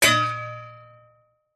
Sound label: impacts metal solid plate disc hit vibrate resonate